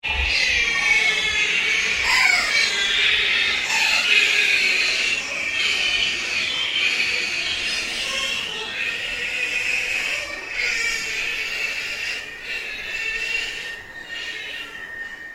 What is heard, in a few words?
Ambient Drone Ghost Chatter; Sound effects created by manipulating other sounds; Scary Soundscape; Scary Soundscapes